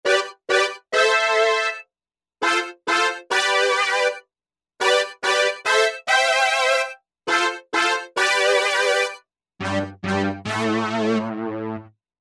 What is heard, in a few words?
Fast Brass, Korg MSC-12S Synth; Synth 3